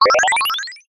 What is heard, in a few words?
multimedia game sound classic 1 up